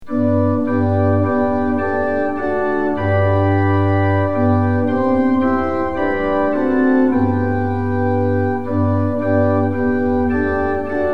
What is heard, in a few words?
tune; hymns; lillenas